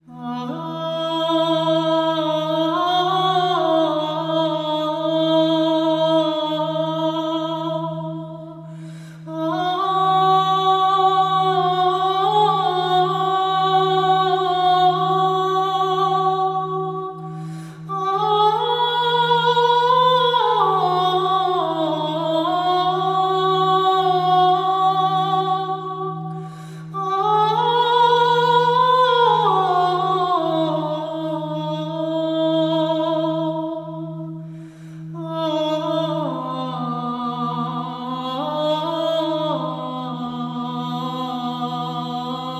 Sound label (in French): Voix et bol chantant